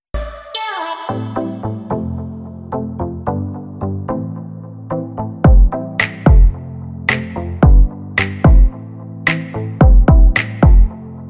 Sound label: thinking of us ringtone